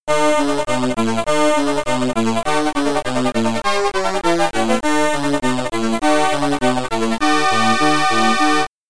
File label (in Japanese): ゲーム・オーバー・ミュージック; Pole Position II; arcade